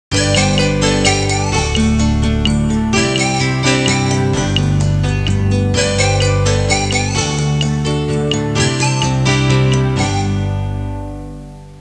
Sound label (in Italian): suonerie musica hawaiian